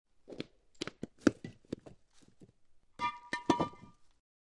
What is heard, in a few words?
Ceramic Rock Movement, Slides And Drops; rocks